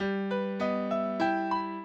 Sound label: minuet, mozart